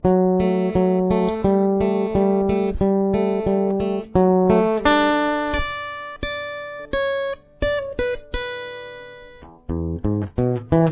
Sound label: solo